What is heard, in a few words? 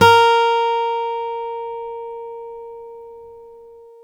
STEEL P A#3, Synclavier, GUITARS; Synclavier Sampler Library